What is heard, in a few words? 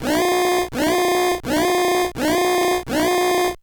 Alarm; rush n attack; nes